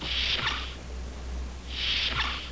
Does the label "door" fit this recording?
Yes